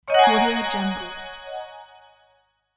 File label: magic spell